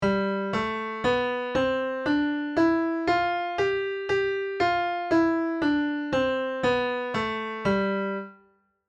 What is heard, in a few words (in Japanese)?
Gメジャー・スケール7♭